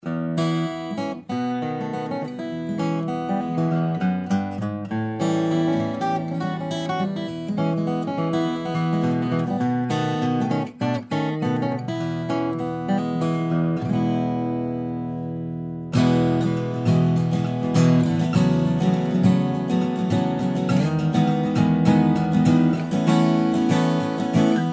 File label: taylor, steel string guitars, flattop; taylor 810 bce limited edition acoustic-electric